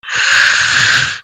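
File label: breath in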